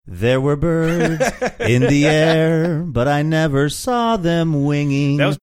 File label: singing there were birds in air